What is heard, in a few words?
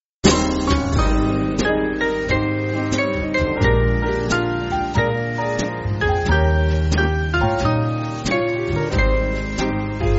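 Band, Backing Files for Churches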